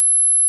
RADAR; WAR

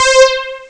SYNTH; cubase; CUBASIS

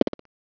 STEP; QUAKE; WILBUR

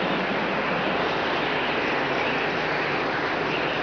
wind

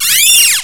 SQEAK

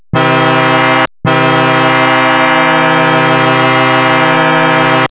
KLAXON

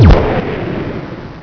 old laser; JOSEPHIN

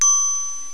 bell; JOSEPHIN

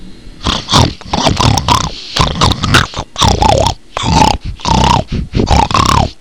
PIG

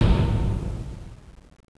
slam

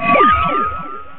warp; ParadroidII

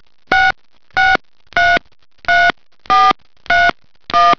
DIAL

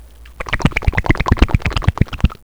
FLAP

